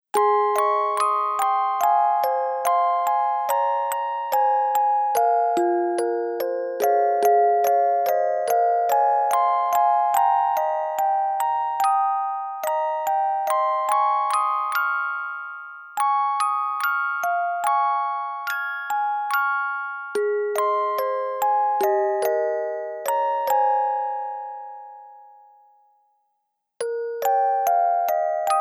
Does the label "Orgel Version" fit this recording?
Yes